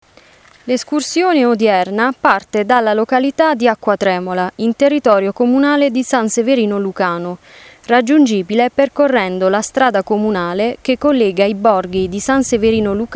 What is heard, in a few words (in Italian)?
partenza acqua tremola